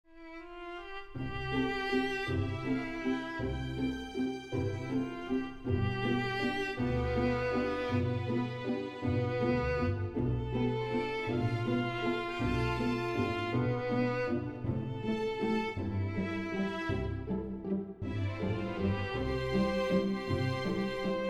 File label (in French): Valse en C